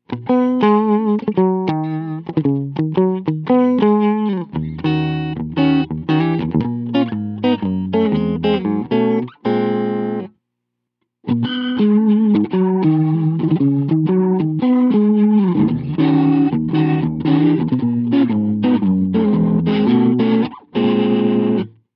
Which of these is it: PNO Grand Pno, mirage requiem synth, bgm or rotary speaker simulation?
rotary speaker simulation